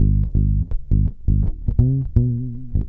166 funky fingerbass woop woop

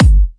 guarded kick; Kicks; single hits

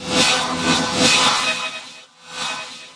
whoosh swhoosh whoosh; Electro and Synthetic

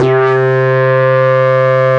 Synthesizer Middle C Notes, Lead

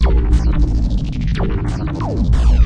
180 wobbly blurble fx

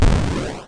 explosion, 8 Bit Videogame; LO FI and 8 BIT KITS

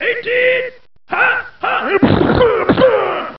Football, Cheesy Lo-Fi Sound Effects

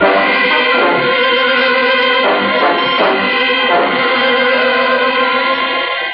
oldtime suspense; ORCHESTRAL and CLASSICAL

